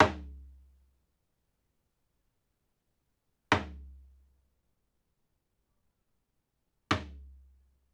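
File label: 3 hits drum cell B rec in courtyard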